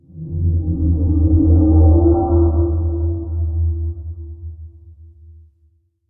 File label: VFX Atmos